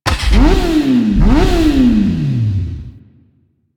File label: Mount, Motorcycle; Warframe; Motorcycle Ninety Nine